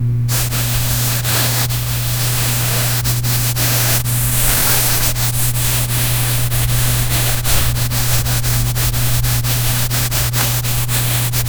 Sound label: space suit rustling